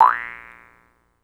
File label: Jaw Harps